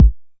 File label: 909 BD; Goldbaby Tape808 & Tape909; The Tape 909